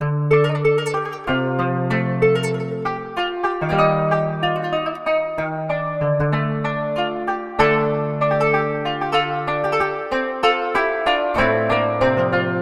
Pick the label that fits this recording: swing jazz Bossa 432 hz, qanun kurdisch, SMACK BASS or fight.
qanun kurdisch